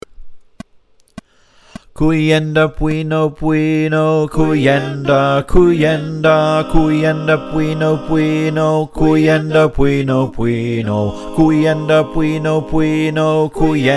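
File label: bass, choir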